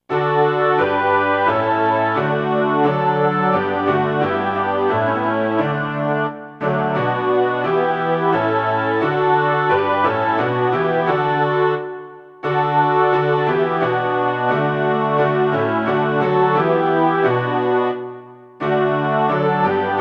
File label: synth homoph; psalm 1